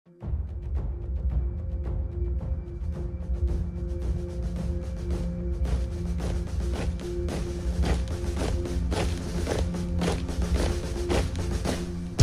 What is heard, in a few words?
ukrainian military song marsh; novoi armii slava ukraini